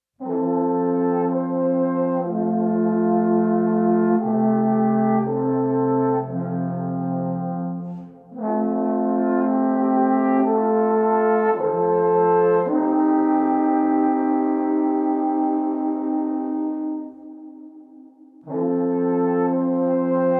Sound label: Alpen Horns